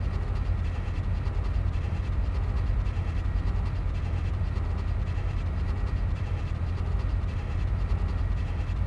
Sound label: Train idle, vehicles; coruscant